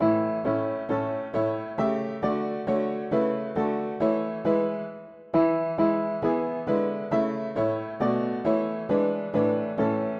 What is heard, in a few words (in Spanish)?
mono piano; hymns; Himnario pequeno